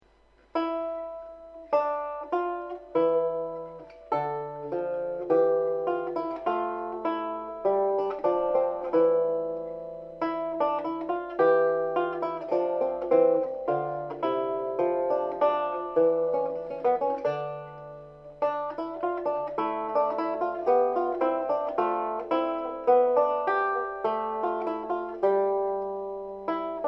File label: lute Bicinia; psalm 132